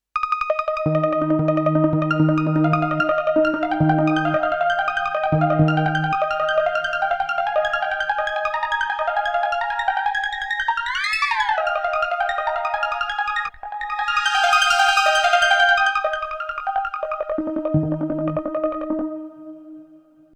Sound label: UDO Super 6 Arpeggiator